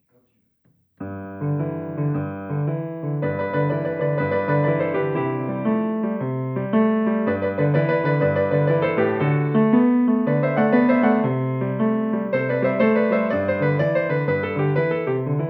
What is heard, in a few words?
Swing